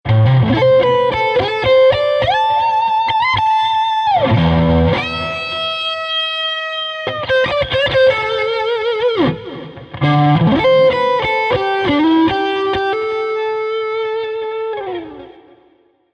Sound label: Melodic Blues; DigiRack Mod Delay II; D Verb